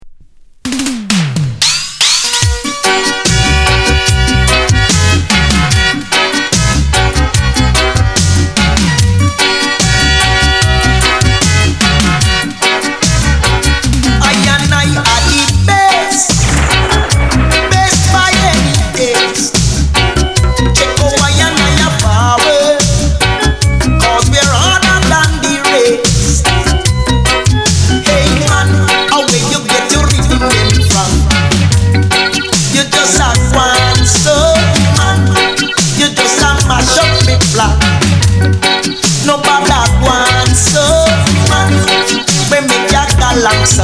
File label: DANCE HALL MUSIC DUB